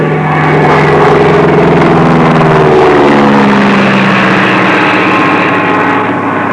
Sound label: ATTACK, Aerei; trasporti